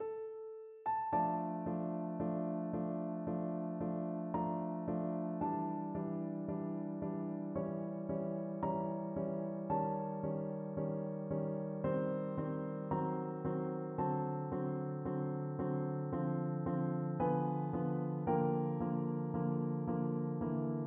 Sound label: chopin prelude piano duet